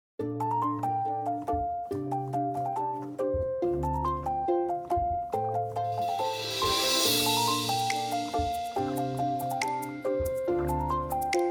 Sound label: inst